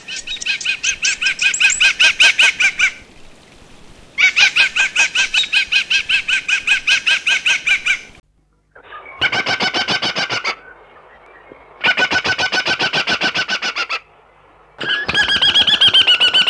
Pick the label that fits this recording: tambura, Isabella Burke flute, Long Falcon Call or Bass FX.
Long Falcon Call